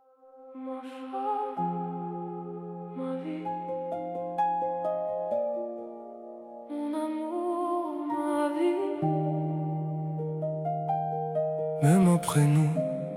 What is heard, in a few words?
Techno Minimal House